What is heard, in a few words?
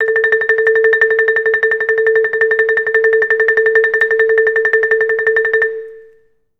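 MR TREM A4, TREMOLO; Best Service; Peter Siedlaczek's Advanced Orchestra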